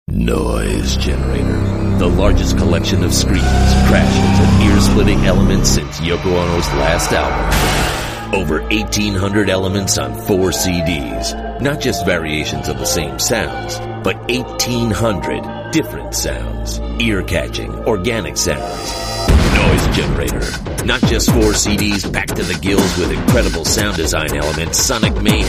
noise generator production elements

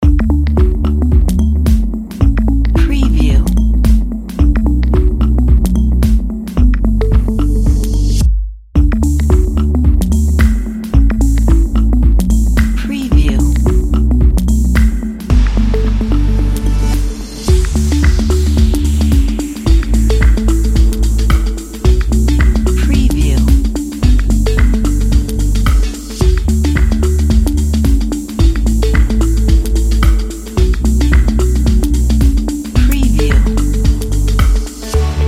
happy and positive

